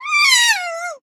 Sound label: otter cry